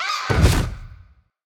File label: enemy harvester death; sfx